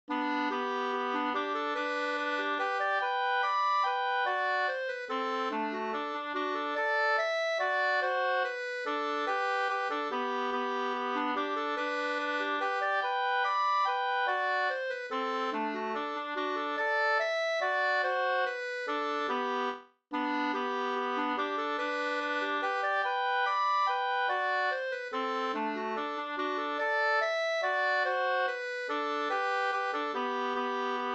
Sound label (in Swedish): mazurka, 2st